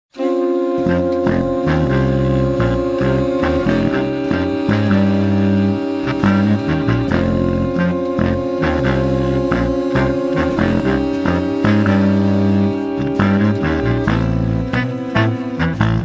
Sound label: Tubax Eb; Benedikt Eppelsheim